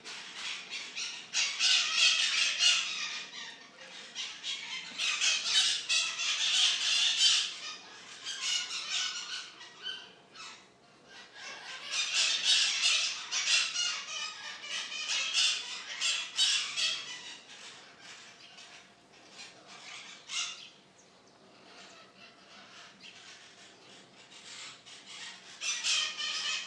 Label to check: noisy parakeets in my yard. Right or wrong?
Right